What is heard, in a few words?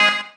Synths G#; Vengeance - Essential Clubsounds Vol.2